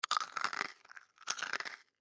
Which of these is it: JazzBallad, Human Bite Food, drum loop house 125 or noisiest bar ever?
Human Bite Food